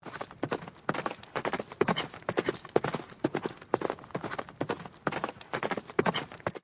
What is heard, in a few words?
single horse rider; Animals